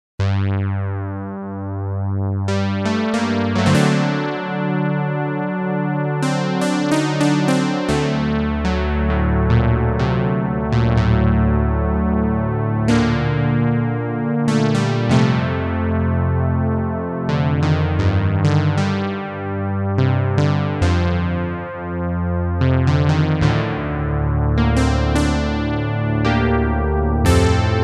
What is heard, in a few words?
pure Saw